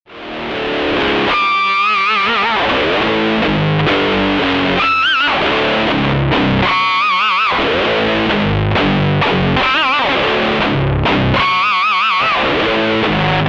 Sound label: freak guitar; amtelectronicsusa; freakguitar demo